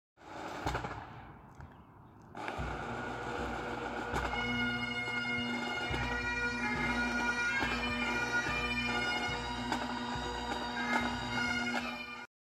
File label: Bagpipes